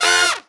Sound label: X P Level Up Horns; subway surfers city sound effects; Android, iOS